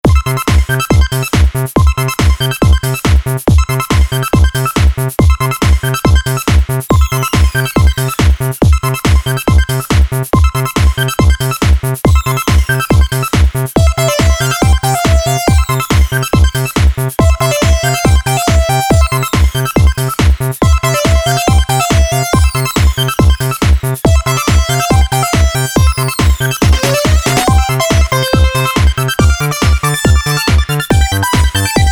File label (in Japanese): コミカルなシーン